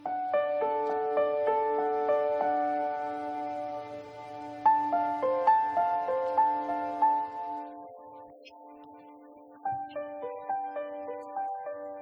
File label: music piano